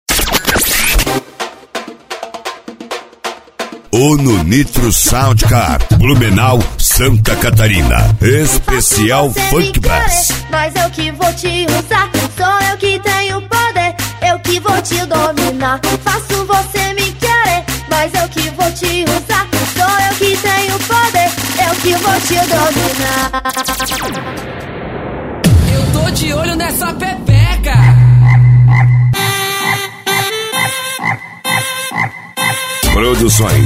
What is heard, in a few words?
sound car; uno nitro